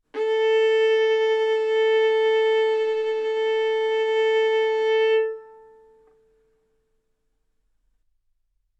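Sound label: Violin arco mf A string A4 B4 stereo; sul A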